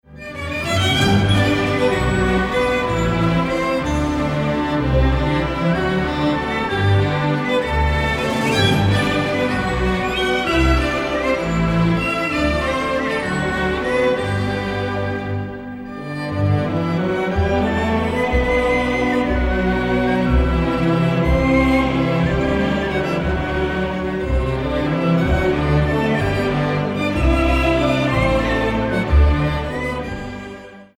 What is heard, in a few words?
waltz